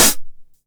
909 HH Tape Sat; Goldbaby Tape808 & Tape909; The Tape 909

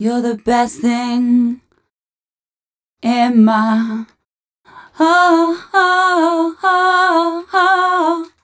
f 132 mel, Vocals, fem

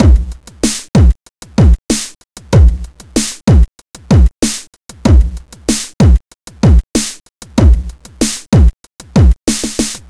Hip Hop, Funky Xtreams; Foundry (Sony Creative Software); Hip-Hop Street Beats Construction Kit; FunkyXtreamsII

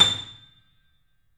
3D Boesendorfer, Pianos, A; E-MU Producer Series Vol. 5 – 3-D Audio Collection

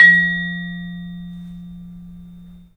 vibes; 3D Sound; 3D Keys VOL-1

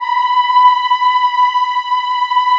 B4 WOM AH, Choir; USB Soundscan vol.28; Acoustic & Synth; AKAI

